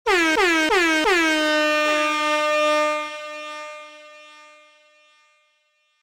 Bocina

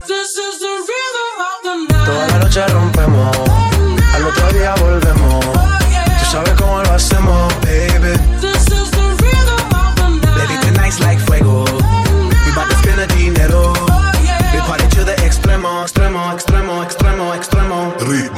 Ritmo

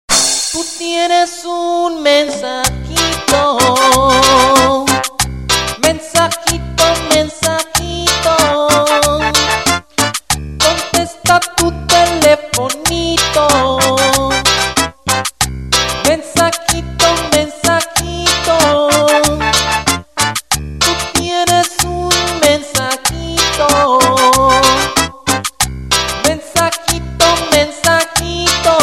Mensajito Cumbiero

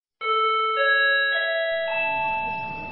tono de avion

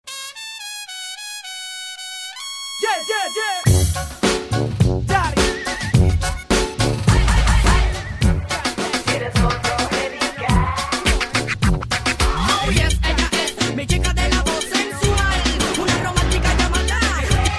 Timbre Loco Latino

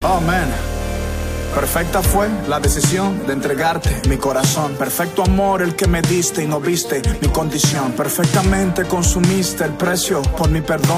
Aleluya amen

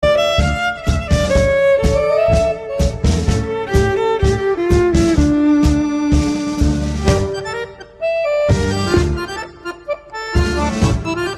guitarra romana

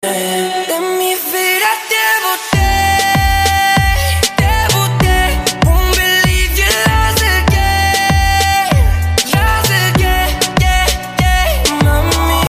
remix

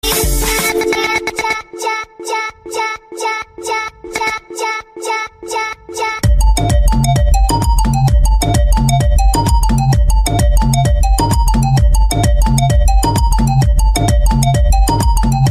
guaracha

